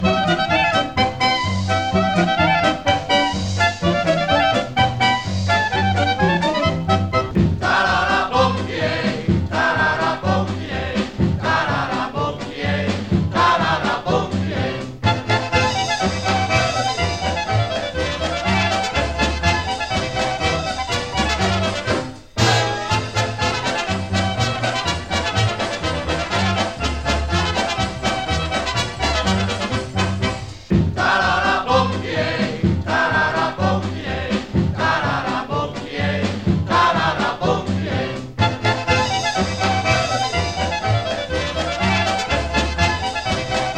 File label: polka